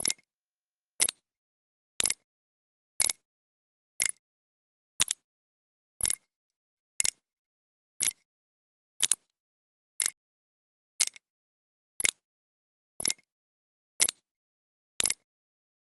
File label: antique clicker clock ticking tick tock click rhythm time watch countdown; sonniss gdc